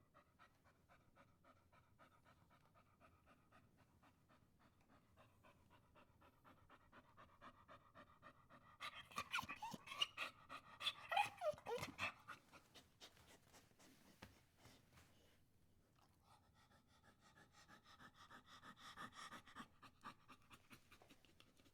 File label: dog whining